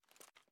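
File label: submachine gun inspect; gmod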